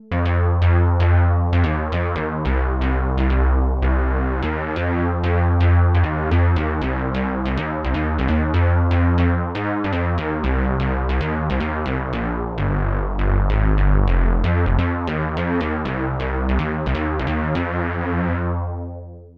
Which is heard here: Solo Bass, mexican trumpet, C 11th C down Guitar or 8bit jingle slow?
Solo Bass